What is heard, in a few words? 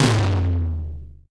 Percussion; Vengeance Samples